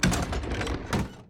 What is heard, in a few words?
barricade door